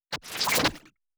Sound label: bubbling